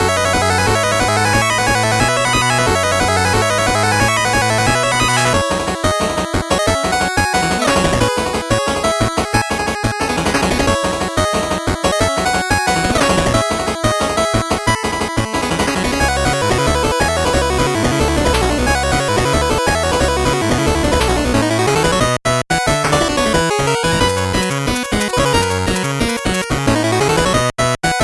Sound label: 8bit bgm fast